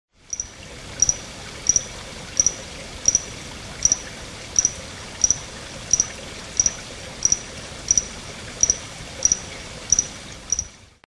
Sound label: Mantella ebenaui